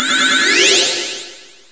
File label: Score, Pinball Demo Music; 3D Ultra Pinball Demo